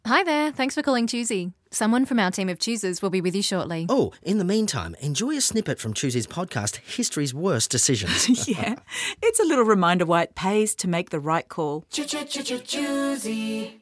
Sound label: on hold